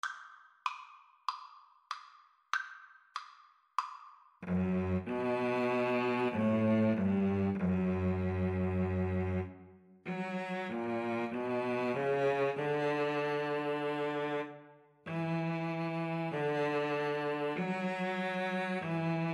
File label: el cant del ocells, Viola Cello Duet